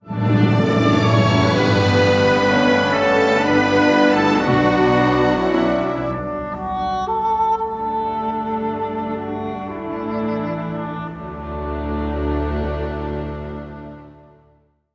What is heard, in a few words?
dramatic big sky bridge; szignal zenek; Várad